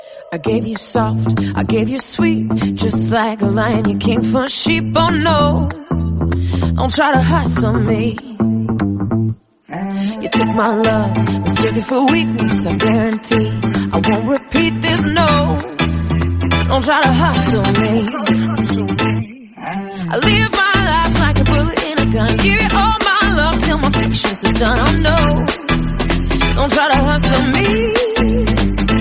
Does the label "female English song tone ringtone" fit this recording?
Yes